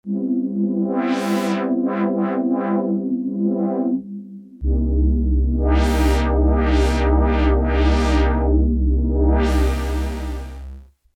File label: analog pad filter